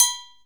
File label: AFRO BELL; AGO GO; AKAI S-Series CD-ROM Sound Library VOL-5